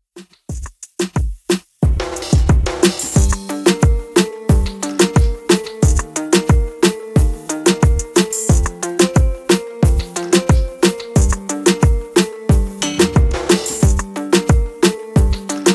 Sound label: Reggaeton 90Bpm